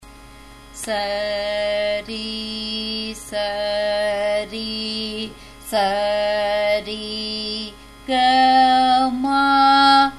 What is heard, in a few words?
Sarali Varise G sharp, music; Carnatic Music; Sarali Varisai in 5.5 kattai (G#, G-sharp)